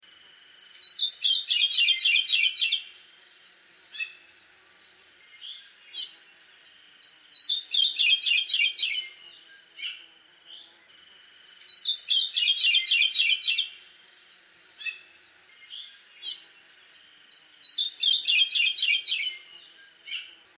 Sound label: Maui Parrotbill Male; Maui Forest Bird Recovery Project